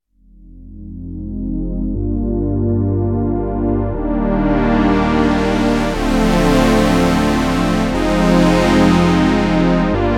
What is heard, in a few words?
Sequenced